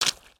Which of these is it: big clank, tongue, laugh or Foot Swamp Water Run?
Foot Swamp Water Run